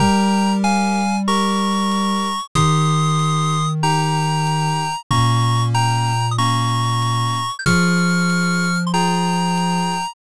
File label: bgm mellow